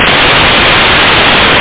clap

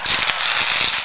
SPARK; lamsword

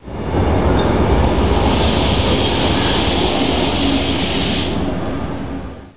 amb

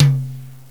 Jazz Tom; Drums; TG300B; GMPlay

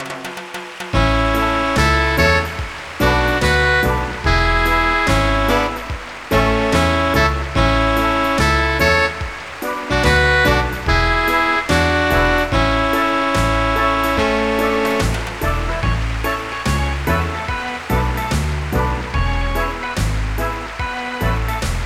Reggae

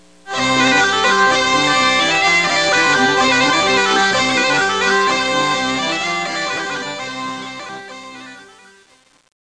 hurdy

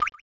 DRIP; AMOS Music

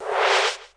REV, DRUM SOUNDS, EFFECTS

